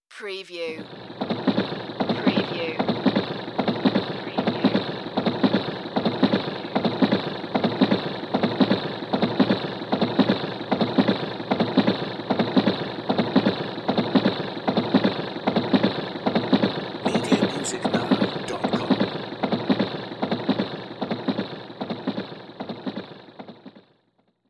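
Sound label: Train Pass By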